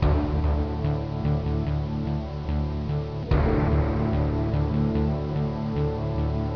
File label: Snake Music; Nintendo; NES